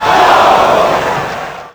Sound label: ooh, Crowd; David Beckham Soccer; XBOX